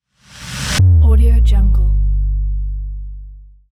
bass boom

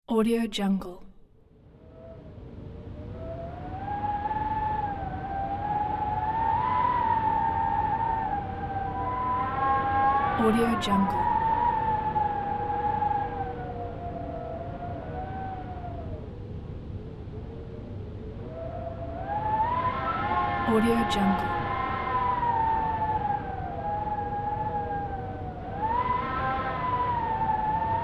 blizzard; vinyl sounds

